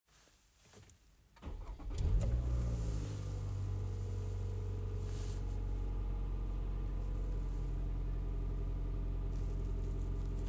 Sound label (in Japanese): もっとも高級感あるエンジン音